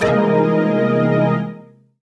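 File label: jazz chord, pads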